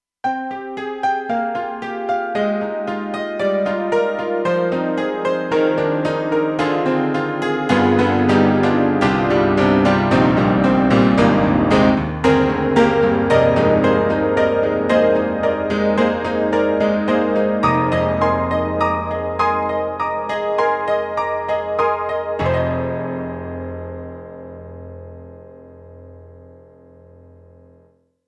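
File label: Roland SC-8850 Honky tonk, piano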